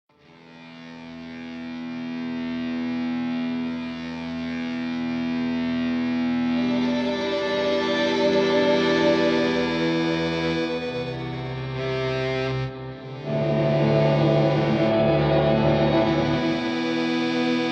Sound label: Evolving Drone